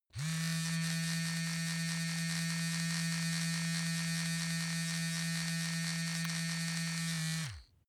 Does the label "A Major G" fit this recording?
No